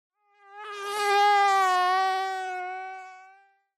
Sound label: Mosquito Real